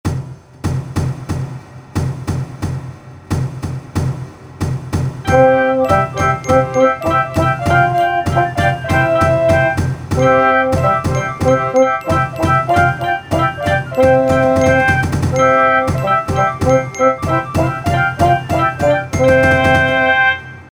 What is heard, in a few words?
march